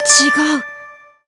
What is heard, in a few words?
surprise; gotts